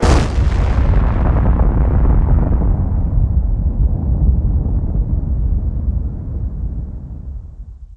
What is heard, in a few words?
A bomb; Gary Grigsby's War in the East